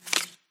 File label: card flip